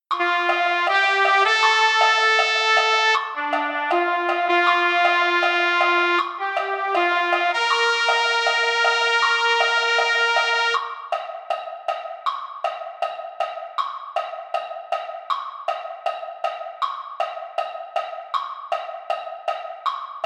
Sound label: Trumpet; concert corps